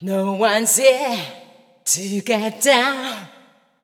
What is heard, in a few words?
VOICE GRL HOUSE 125 A, House Voice